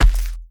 Footsteps Dirt Run; The Town

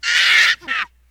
Monkey Dies

